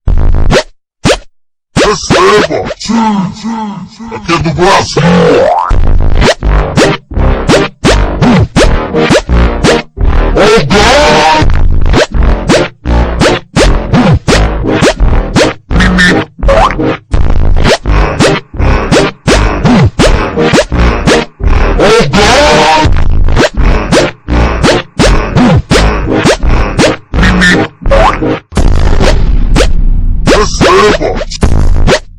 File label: funky super slowed